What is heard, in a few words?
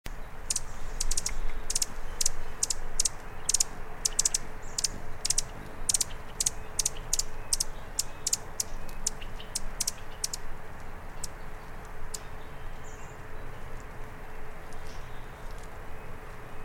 Anna's Hummingbird Sound